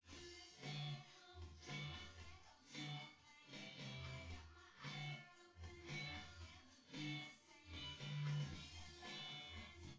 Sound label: wdrums synthconv